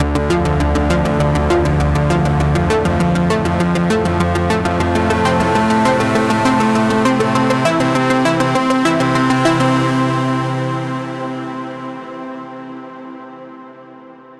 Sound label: Sequenced Back Trancing